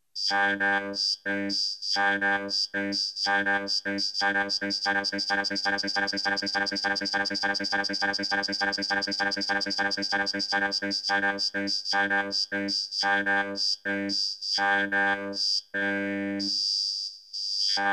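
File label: Vocoder; flame2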